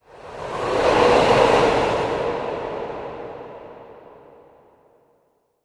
Misc Short FX

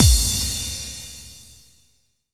BD-FX, Dance Mega Drums; Best Service

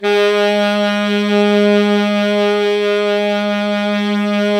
saxophone alto, saxophones; Roland - LA Composer vol. 1

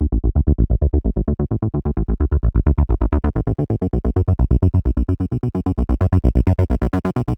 Dmaj Bass Alternating; Zero-G; Future Wave

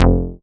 BAS F1, SCI Prophet-5 synth bass

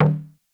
Conga Jug; Lost Tapes From Mars

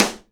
SIDE STICK, Live Snares

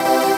HOUSE, House Dance

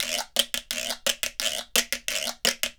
GUIRO; ILIO; Synclavier Percussion 2; World & Orchestral

